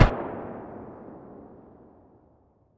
ash12 muzzle mono far, weapons; STALKER; IWP

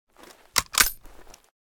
mosin reload empty, weapons

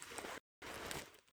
torch use; STALKER; IWP; item usage

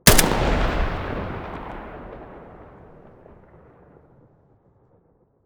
galil muzzle mono

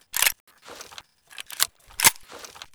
mp133 reload empty, weapons

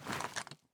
aim out, weapons; STALKER; IWP

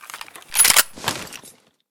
misfire, weapons; vpo101

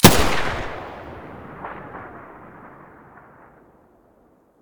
muzzle ump45 stereo, weapons

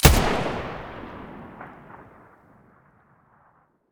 mdr muzzle stereo, weapons

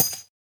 Shell heavy dirt; STALKER; IWP

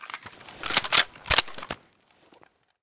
l85 misfire, weapons

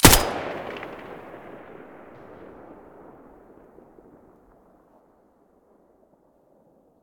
M4A1 muzzle stereo

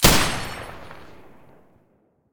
silencer M249 stereo

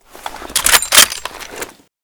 misfire, weapons ash12; STALKER; IWP

